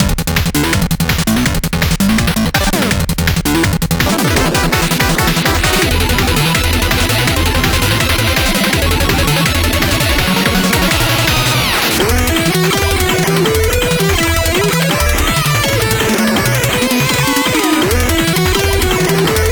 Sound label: 8bit BGM